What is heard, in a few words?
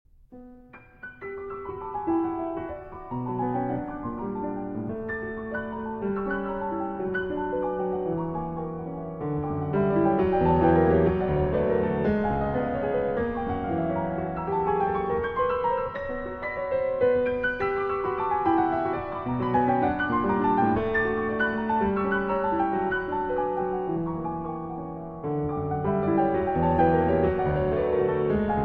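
Variations on a Polish Folk Theme, Piano Works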